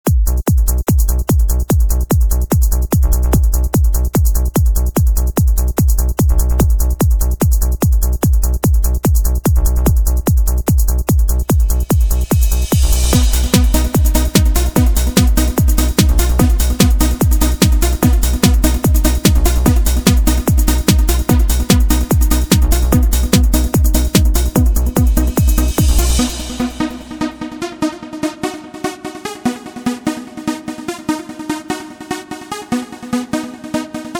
Sweet Noise

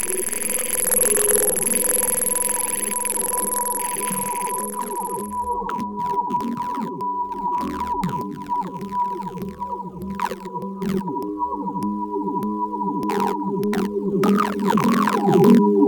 piano; muziek oorspronkelijk bedoeld voor 2 piano

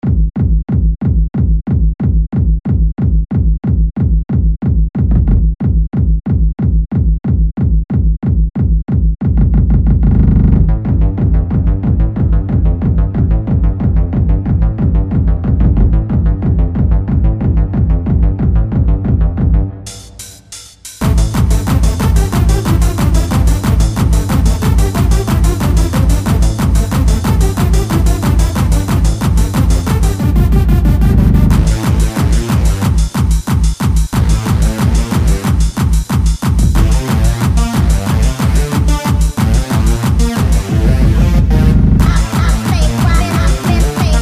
Hardcore Gabba

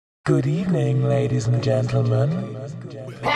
Noiserr Mix

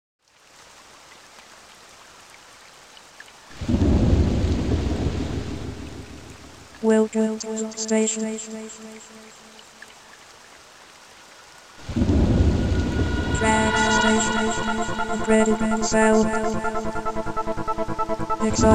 trance; trance station

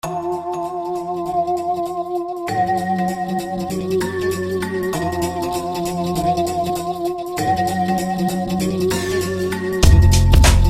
Instrumental

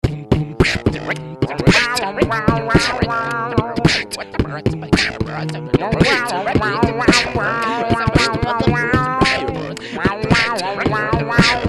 beatbox mix

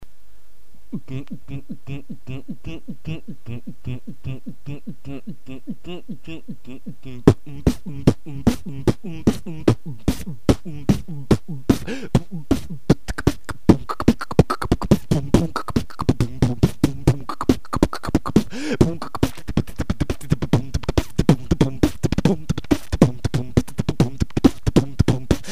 beatbox

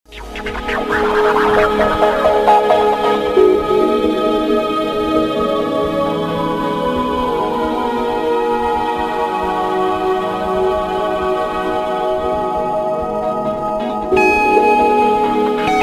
conga

